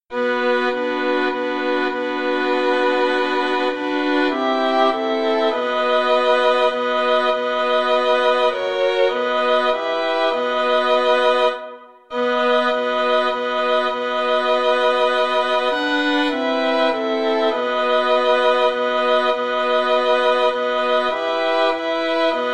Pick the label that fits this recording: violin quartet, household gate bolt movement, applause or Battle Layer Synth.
violin quartet